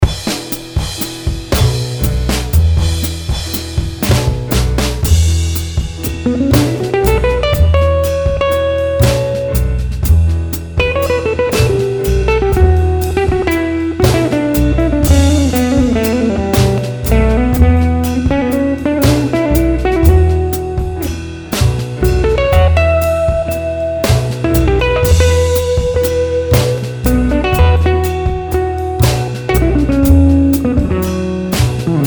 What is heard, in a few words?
improvising mix